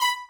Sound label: violin